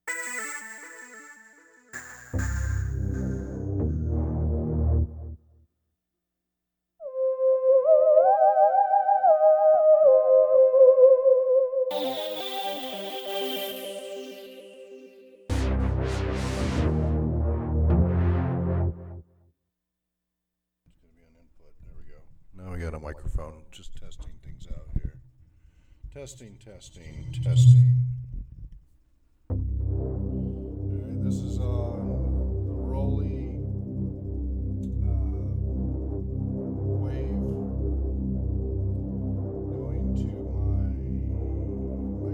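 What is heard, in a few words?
live broadcast improv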